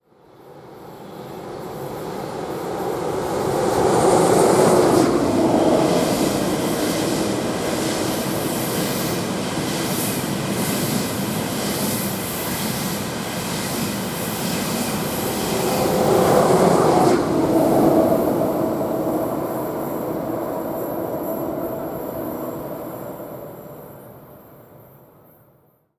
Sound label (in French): trains diesel et electriques; sonotheque